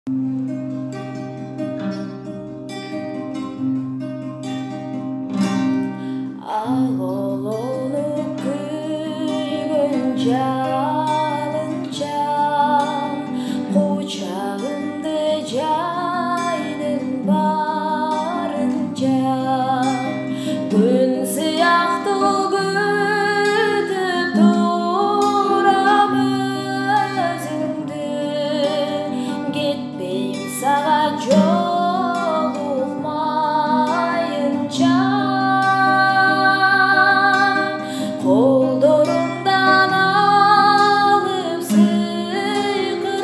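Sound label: guitar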